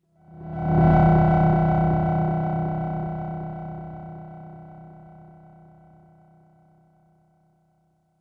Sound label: Ambient Transition